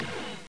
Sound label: Zip Away; Michigan Football